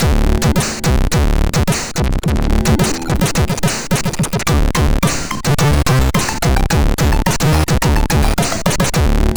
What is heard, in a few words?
Test, sid MOS6581R4